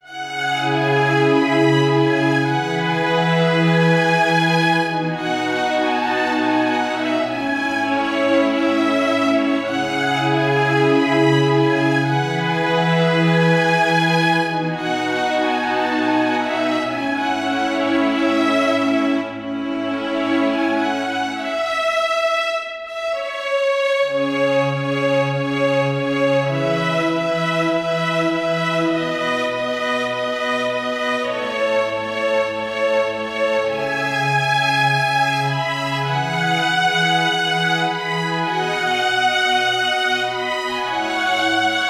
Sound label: String Quartet; quarteto de cordas